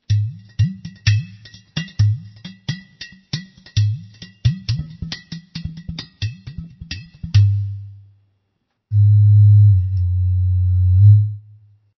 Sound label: udu